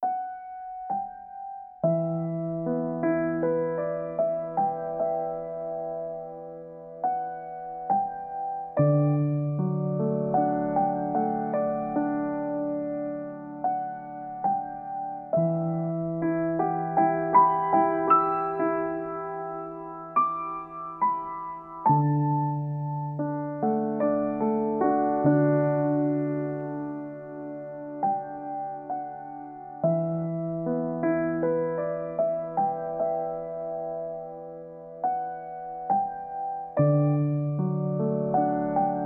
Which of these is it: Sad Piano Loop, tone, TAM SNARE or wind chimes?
Sad Piano Loop